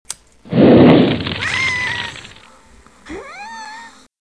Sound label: cat On Fire